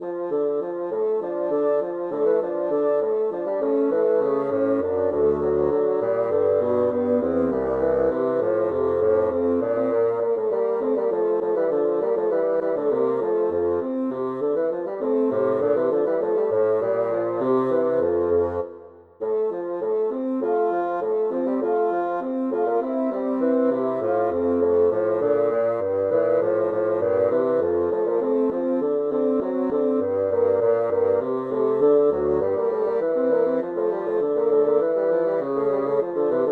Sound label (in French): sonate pour bassons; boismortier joseph bodin